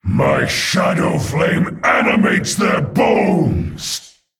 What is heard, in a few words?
HERO POWER